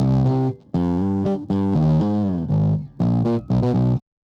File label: bass drive; modular effect board; sonic instruments